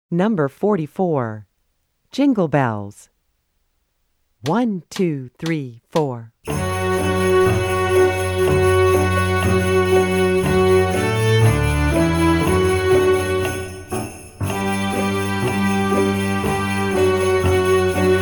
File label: Jingle Bells; New Directions for Strings Book 1